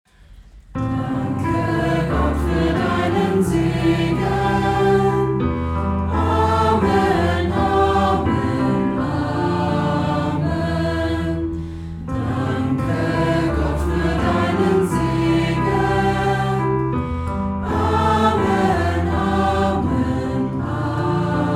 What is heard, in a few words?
Melodies